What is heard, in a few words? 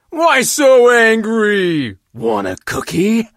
monster hurt vo